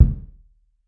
rich low end bass drum c key

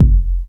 round steel kick drum sound f key; tuned drums f key

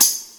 classic low treble hat one shot g key

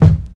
short kick drum sample D# key

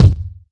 bass drum sample d sharp key; kick drum

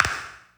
short reverb hand clap sample g sharp key

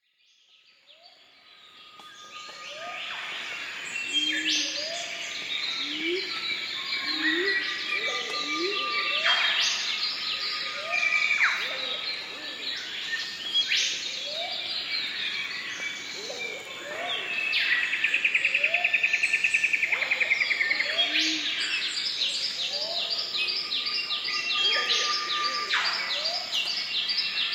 tropical rainforest biodiversity soundscape

